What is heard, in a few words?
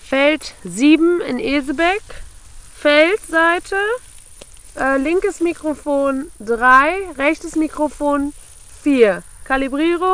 birds